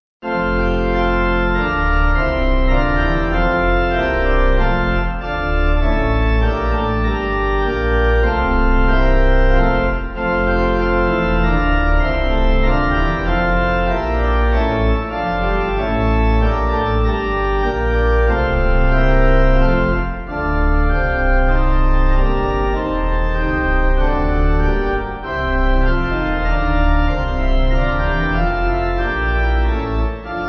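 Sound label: Pipe Organ, Music Backing Files for Churches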